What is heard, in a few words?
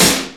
ROCK GUITAR